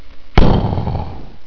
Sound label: BOOM